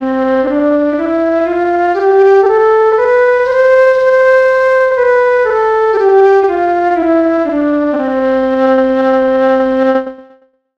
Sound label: tempo 150 percent